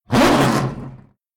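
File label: ui button play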